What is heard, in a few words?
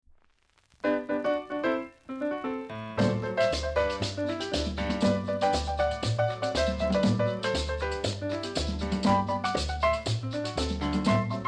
PIANO MERENGUE